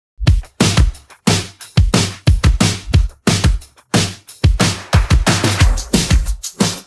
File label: pattern, drums